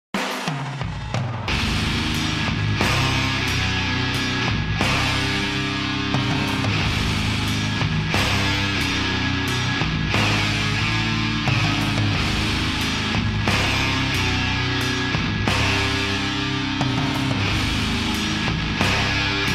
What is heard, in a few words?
metal riff